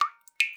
Drips

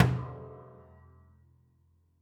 Body Thump Sgl, Piano Destruction

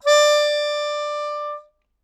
Melodica Long, Long Notes Chromatic

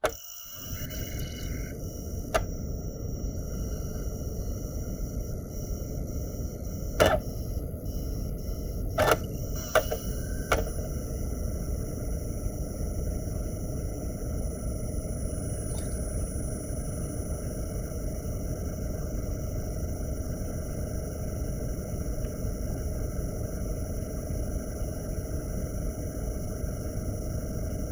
Spin Up, DVD Drive Internal

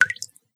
Tap Drip; Drips

